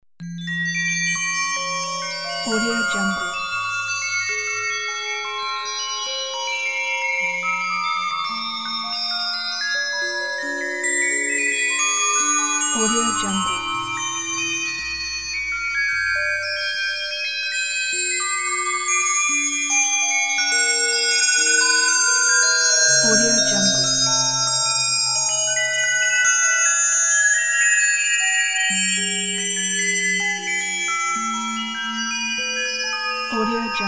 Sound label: magic bells; soundfoodsfx